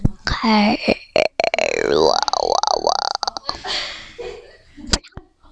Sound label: knife